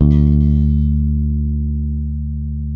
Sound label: bass, sustain; Sampleheads; Will Lee Bass Library